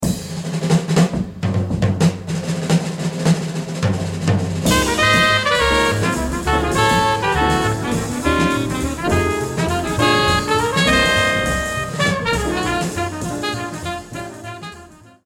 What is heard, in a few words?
shout